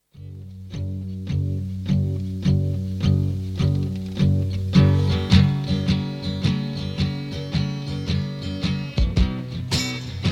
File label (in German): Musik; Attac; LoRa